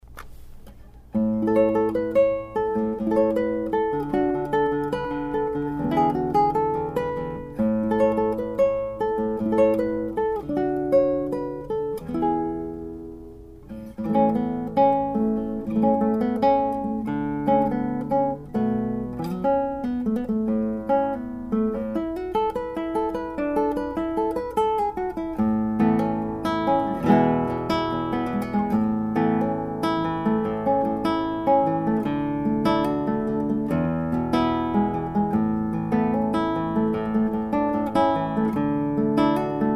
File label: SOLO GUITARIST